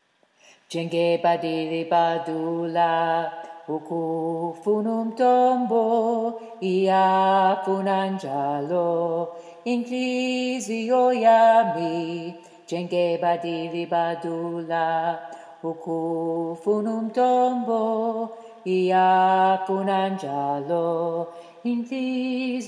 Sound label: Njenge Bhadi Libhadula BASS